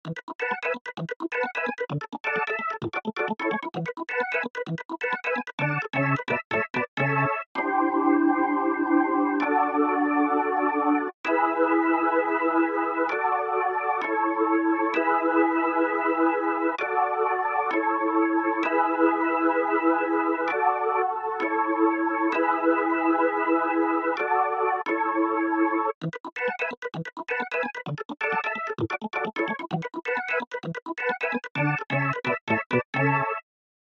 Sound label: Organ Vibe